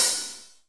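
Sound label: hi-hat, Real Mega Drums; Best Service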